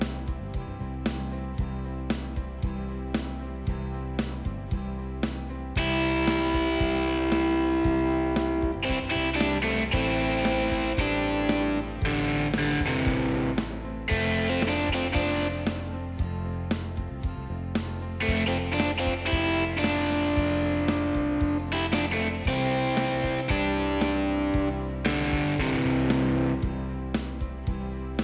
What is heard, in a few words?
GUITAR SOLO